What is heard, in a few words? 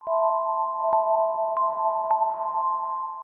phone incoming call short